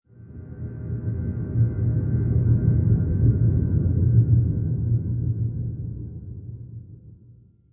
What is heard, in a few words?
Stinger Decrease Action